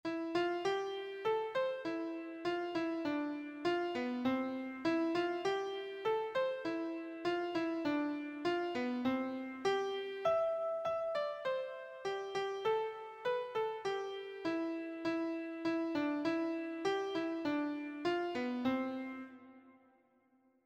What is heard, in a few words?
wildwood flower, keyboard